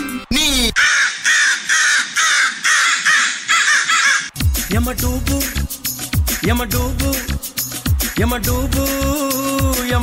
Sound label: telugu song ringtone